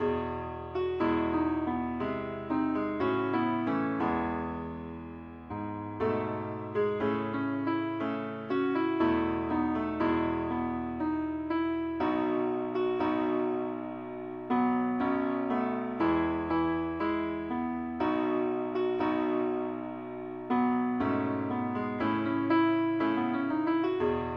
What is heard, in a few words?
keyboard only key e; pilot